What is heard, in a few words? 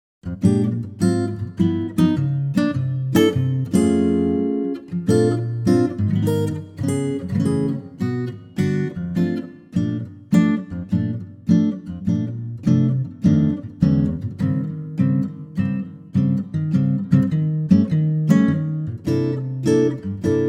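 Acoustic Guitar Bb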